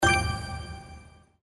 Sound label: pick treasure; Monkey Spin